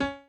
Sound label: piano chord